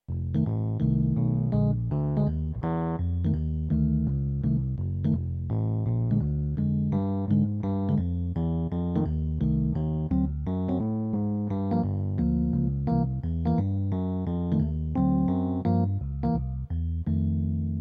chord

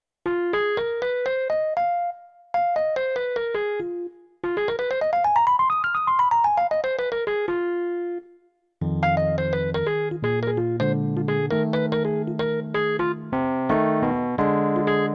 blue note, jazz piano

